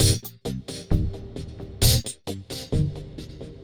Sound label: Funky 125-132 Cm7